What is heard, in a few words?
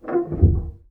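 Ped Noise Etc Single Push, Piano; Piano Miscellaneous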